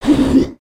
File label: Skullken Idle